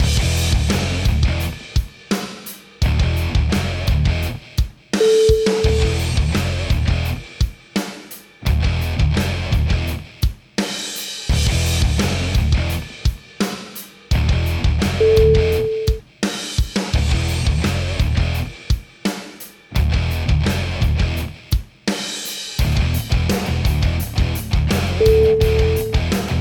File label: action guitars